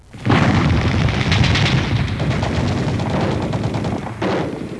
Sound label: guns